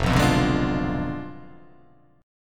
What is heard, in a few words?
F Diminished 7th F down